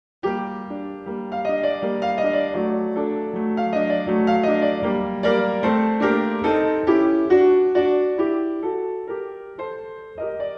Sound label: Polonaise in G minor piano